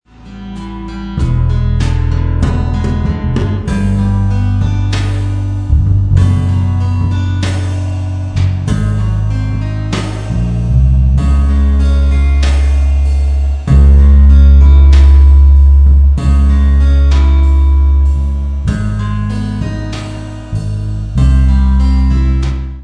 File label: groove